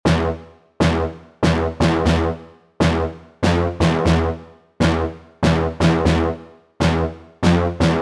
brass classic; serum preset

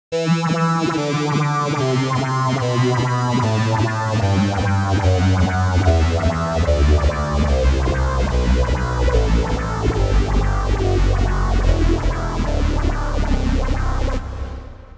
rhythmic

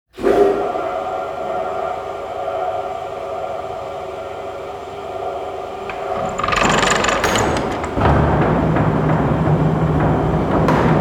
laundry mangles

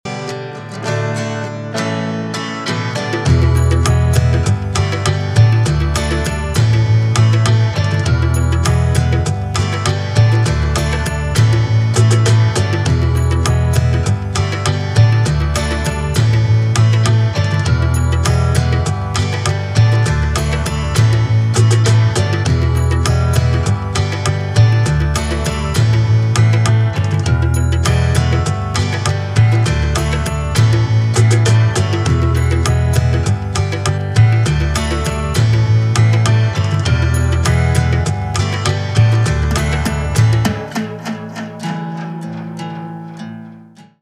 basement; black octopus sound

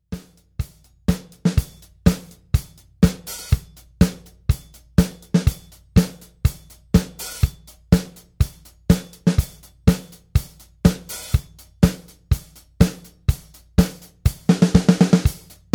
80s pop

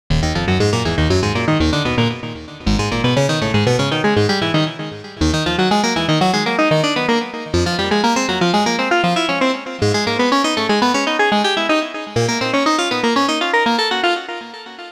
seq 16 roland sh-01 waveta

